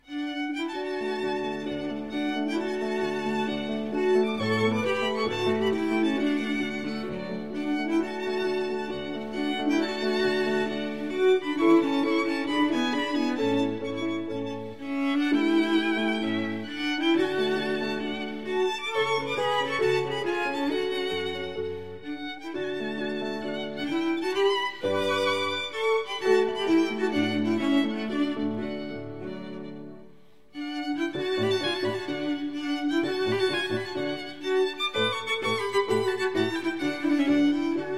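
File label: piano trio; donizetti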